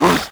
grunt short, zombie vox; creatures

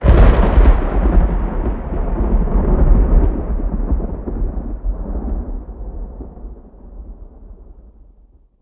thunder close, rainscapes; Counter Strike Model Pack; CS:GO; ambience

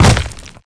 land, footsteps player debris

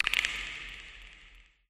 amb creature antlion click; Half Life Alyx

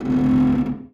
cable stress; ambient; Counter Strike Model Pack; CS:GO; dust2

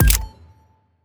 main menu press news; ui

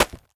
impact, paper newspaper

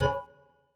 lobby notification chat; Counter Strike Model Pack; CS:GO

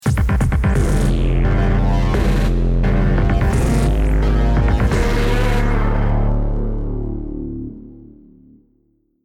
deathcam

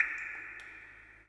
ambient water drips; nature; Portal 2

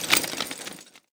suit, footsteps; player

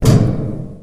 metal grate impact soft; physics

